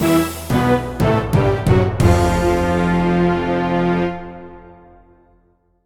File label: Dramatic Music